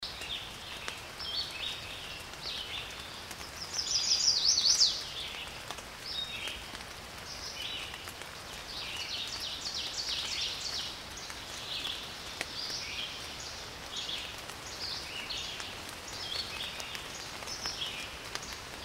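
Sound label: shum osennego lesa, sound of autumn forest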